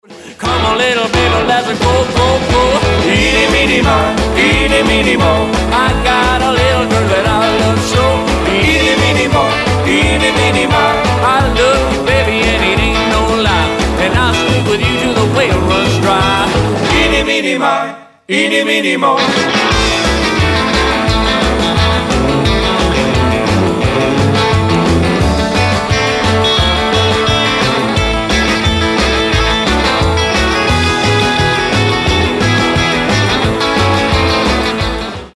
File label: Rockabilly Party